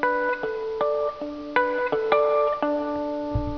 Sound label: natural, Guitar Harmonics, Natural Harmonics